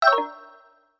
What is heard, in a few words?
Stamina Gauge Empty; Skyward Sword; zelda